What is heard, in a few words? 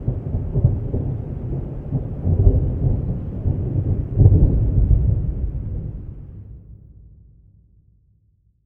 thunder; AfterStory; Doki Doki Literature Club